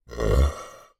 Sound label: human burp deep tummy